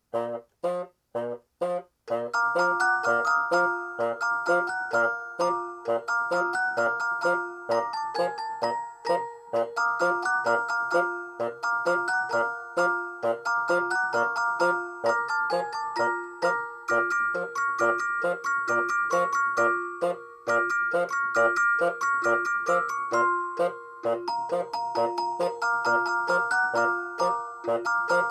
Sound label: ding ding toot